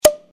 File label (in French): bouchon